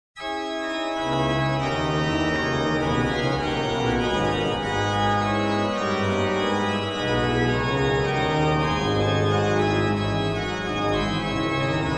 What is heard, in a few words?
Chorale Prelude; Christ lag in Todesbanden